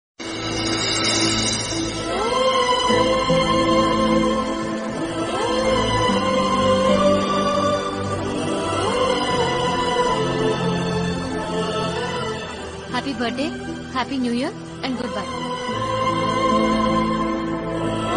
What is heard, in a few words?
movie emotional love bgm ringtone; oye; siddhartha